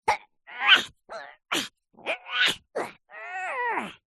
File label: cartoon character vocalisation high pitched lifting pushing or struggling